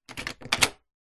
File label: household door between garage and house open